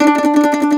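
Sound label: MANDOLIN TREMOLO, Plucked Strings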